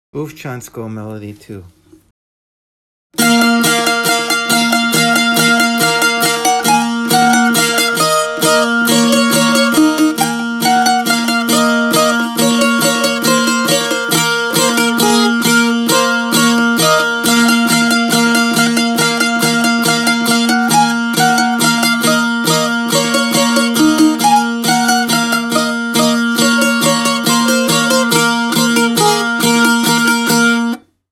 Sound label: tambura; Bufcansko